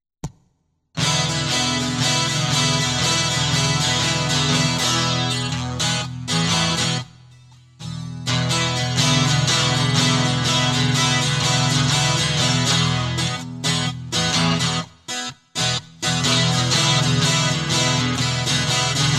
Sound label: Guitar Loop